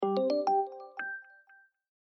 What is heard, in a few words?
job complete